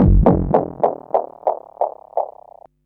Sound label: bass delay fx; EZRollers